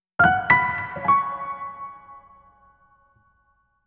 Clear Jingle; bayonetta origins cereza and the lost demon; Forest

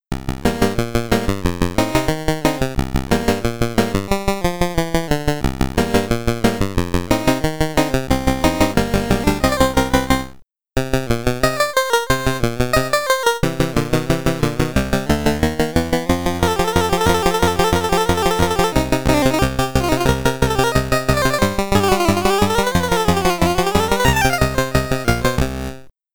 Piano Tune (SID Stereo); Maniac Mansion; Commodore 64

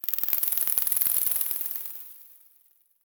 environment cicadas

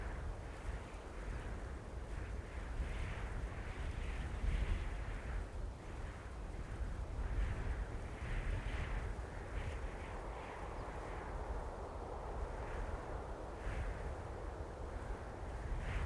wind loop

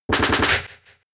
Pluck

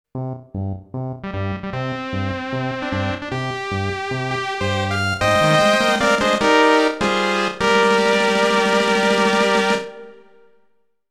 War Success; Koei Original BGM Collection

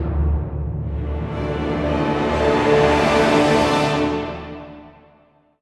Music stings Space door; LEGO Marvel Super Heroes

